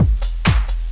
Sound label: beat